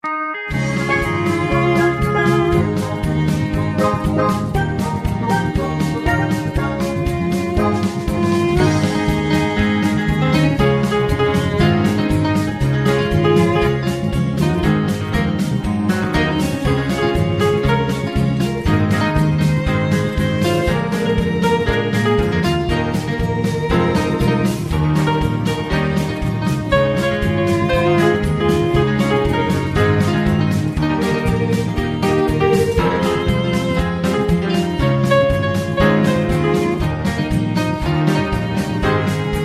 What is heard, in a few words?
Low Key